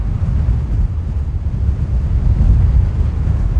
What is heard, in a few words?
Wind outside light